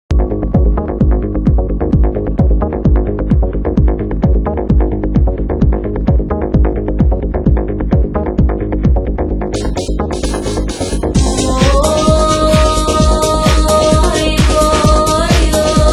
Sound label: drums; Красивые мистические ритмы для динамической медитации